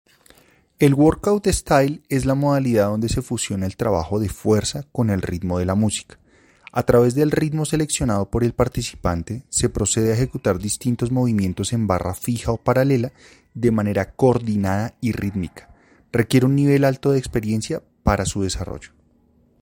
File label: workout style